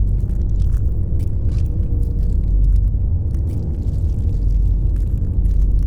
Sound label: pro ship colossus idle; biogenesis